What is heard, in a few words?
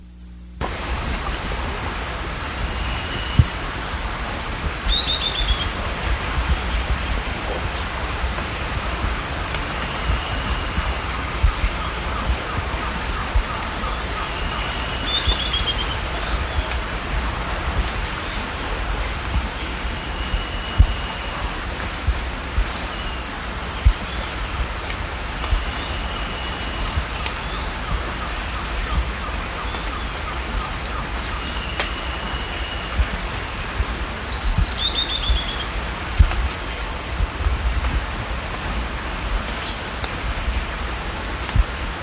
bird